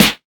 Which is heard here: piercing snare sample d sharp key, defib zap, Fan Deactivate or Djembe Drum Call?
piercing snare sample d sharp key